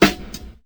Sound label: reverb snare g key; snare drum samples